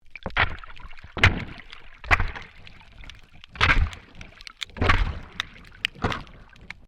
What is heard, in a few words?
MAINTAINENCE WORK UNDERWATER SHOVEL SCRAPING; Maintainence divers off StKilda Pier; Construction